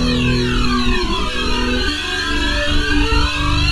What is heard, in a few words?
slice loop